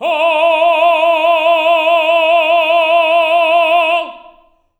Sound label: TENOR F E4; Spectrasonics; Symphony of Voices